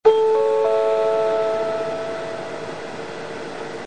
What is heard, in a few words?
limousine, bus chime; keikyu; ３打音チャイム